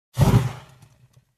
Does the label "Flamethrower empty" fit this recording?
Yes